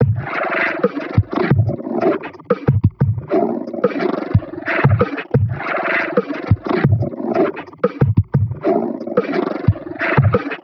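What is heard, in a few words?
Annoya Loop, beats; Sonic Foundry (Sony Creative Software); Esoterik Beatz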